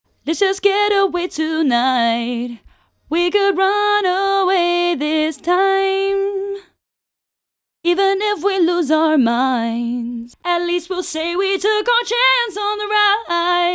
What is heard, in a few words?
127 Fm Verse & Chorus; Titan